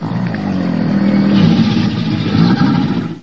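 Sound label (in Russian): звуки крокодила в дикой природе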